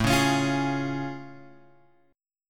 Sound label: A Augmented Major 7th A; A+M7 Chord {5 4 X 6 6 4} strummed